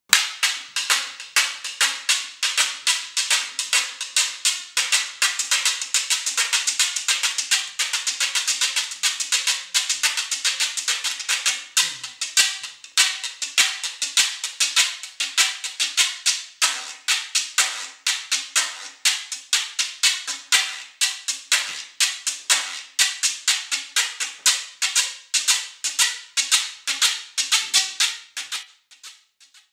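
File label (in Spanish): güiro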